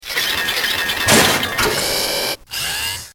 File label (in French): machine